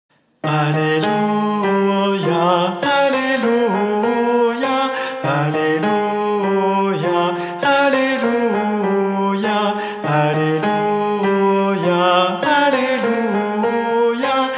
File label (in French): Alleluia ! - Guide voix - Sopranos; Missa Pro Europa